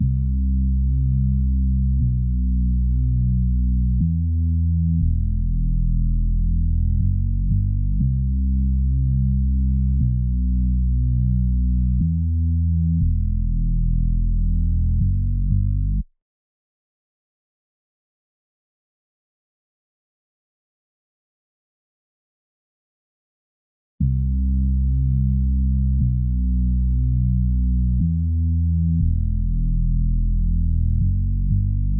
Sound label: 120 BPM E Min Bass; Melody Loops; Loop Stems; Hip Hop; Cymatics; Hibiki